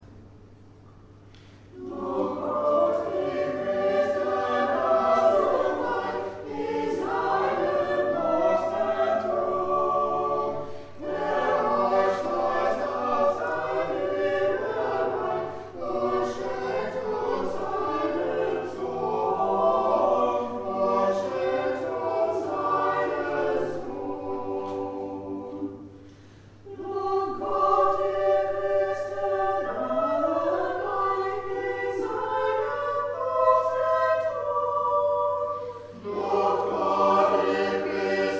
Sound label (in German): Lobt Gott Chor; Orgelbuchlein